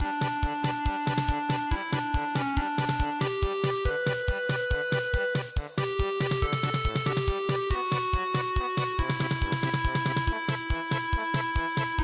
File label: German Polka, midi